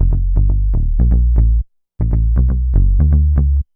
Synth Bass

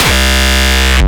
Speedcore Kick

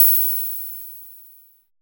CHEEZE OHH, TECHNO; Drums In Your FACE!

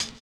HI RIDER; Spectrasonics; Metamorphosis

